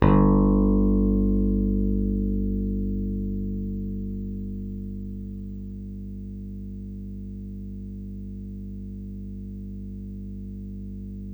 PICK BASS, Electric Basses

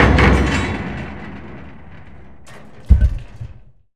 heavy metal, Heavy Metal Fall; E-MU Producer Series Vol. 3 – Hollywood Sound Effects

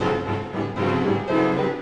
Orchestral Loops

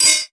SOUND EFFECT, Metal; AKAI S6000 CD-ROM - Volume 1

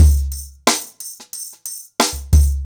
Nuclear 90BPM, ACOUSTIC DRUMS

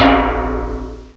GEDCLANG, BELLS; M8; CMI Factory

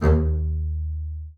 D#2, bass spicc; Xsample VOL-5

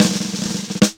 Kitchen Roll, Drum Rolls; Zero-G Jungle Warfare 1, 2 & 3; 90 s Sample CDs

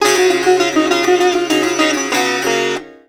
SITAR GRV, World Colours; Best Service

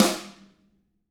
TAMA RIM, Drums; TAMAKIT

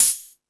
High Hat, Drum Machines

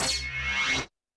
Roland TD-20 Percussion

